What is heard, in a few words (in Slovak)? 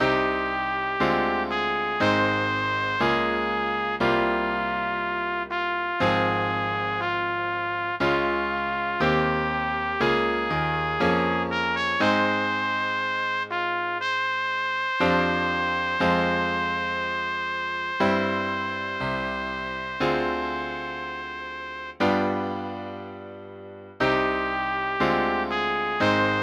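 Smútočná hudba - pre trúbku a klavír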